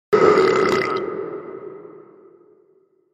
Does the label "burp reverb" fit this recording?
Yes